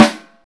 steel snare drum a key, Royality free snare drum tuned to the A note; snare-drum-samples